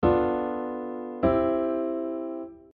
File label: tritone resolution